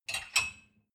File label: dishwasher cup grab soft